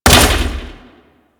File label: Grenade Bounce; Warframe; Weapons; Corpus